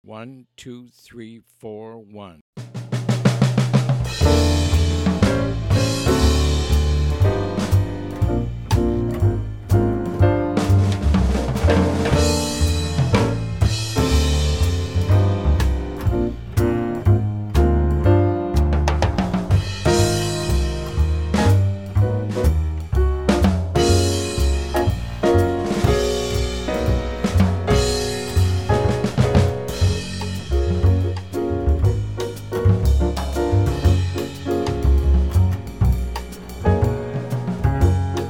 minus melody

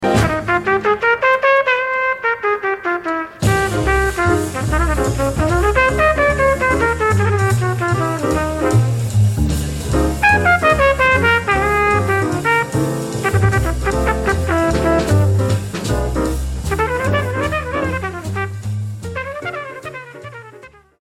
solo

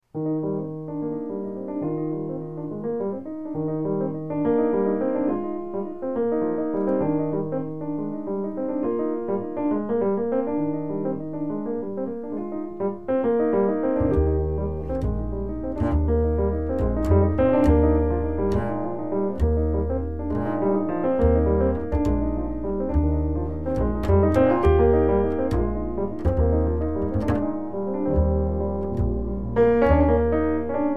Waltz minus Drums

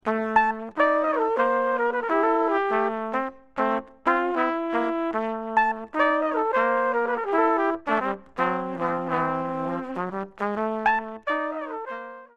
duet

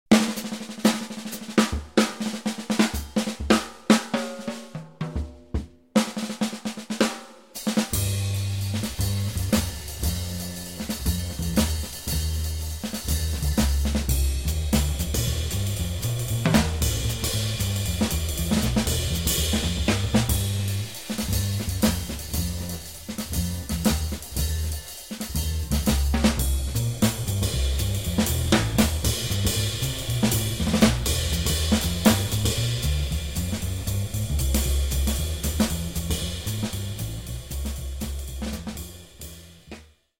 bass drums only

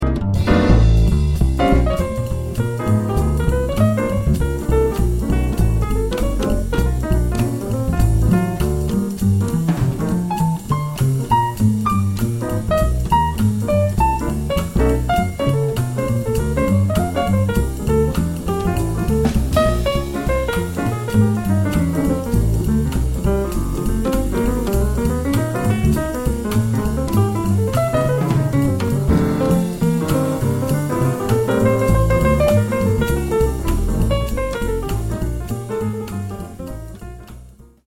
piano solo

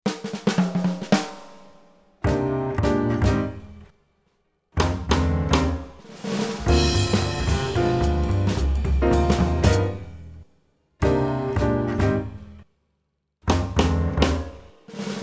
minus melody

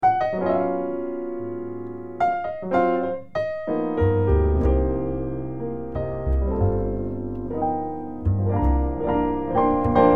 minus Drums

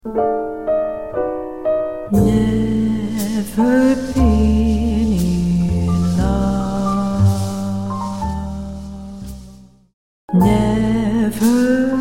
open phrasing

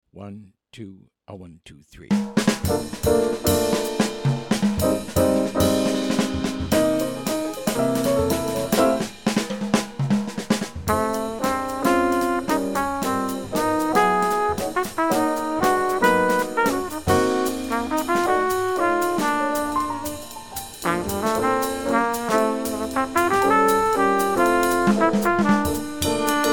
minus bass

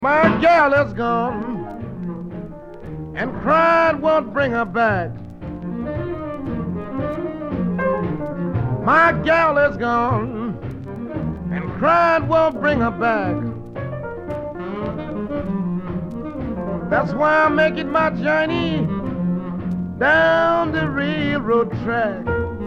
vocal melody

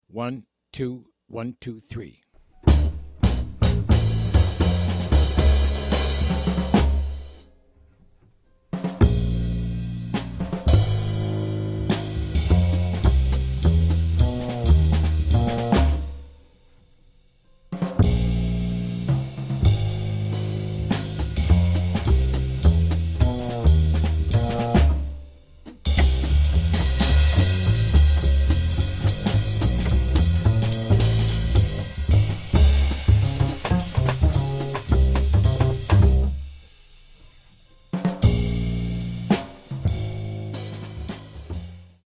minus piano

